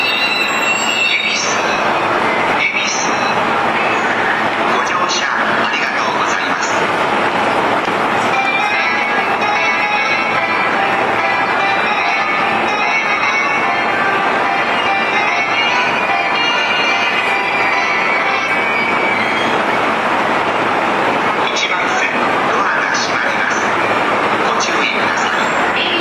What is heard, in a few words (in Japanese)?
恵比寿駅, 山手線, 渋谷・新宿方面; 以前とはアレンジが異なります。; 品川・東京方面